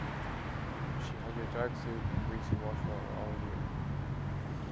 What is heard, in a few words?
mix Street